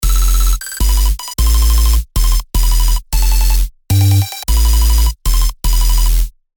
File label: glitchy lead; lead arp chord melody